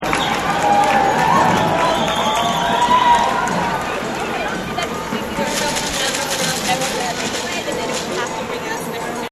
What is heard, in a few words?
Protest Crowd; Chanting; Yvette Jackson; Voice